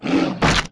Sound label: brutal murderer attack